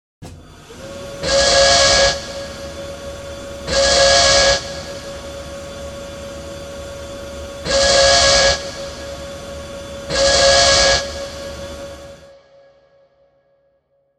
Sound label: Warning; gekiban